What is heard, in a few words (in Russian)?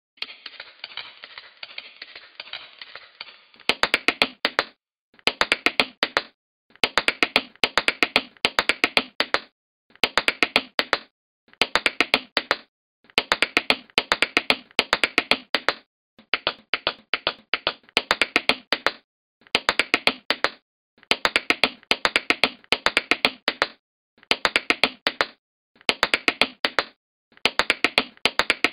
boj chechetki